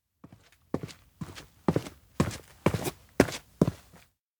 steps outdoor town teacher approach; anemoi

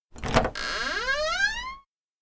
door townhall open; anemoi

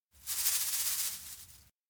bug move; anemoi

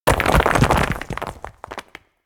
landslide collapse; anemoi

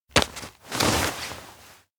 paperbag open uniform check; anemoi

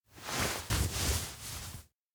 towel receive wipe; anemoi

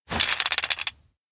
boardgame spinner; anemoi

